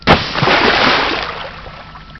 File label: snowball splash; christmas craft